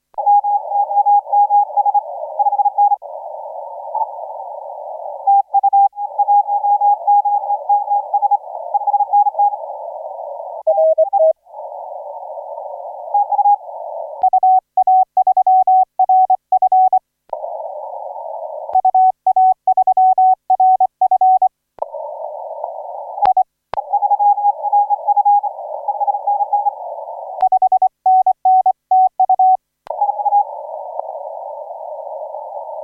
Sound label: 20m